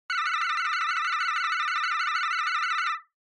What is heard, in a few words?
Censor Bleep Synth, Long